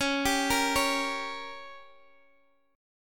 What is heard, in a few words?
Bb Minor 6th, guitar